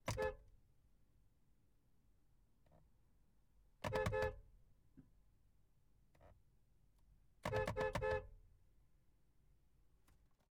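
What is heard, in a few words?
peugeot 307 sw onboard horn various; ambeo; Sonniss GDC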